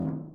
Metal Box Impact

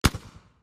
Flash Granade

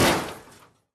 Metal Solid Impac Hard

